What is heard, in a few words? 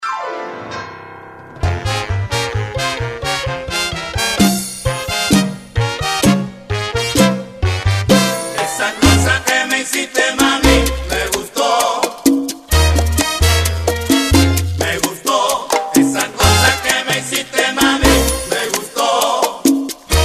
Cha Cha Cha